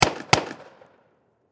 Warfare Pistol 45 Magnum Double Shot Distant Perspective; Pistols